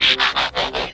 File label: bumblebee off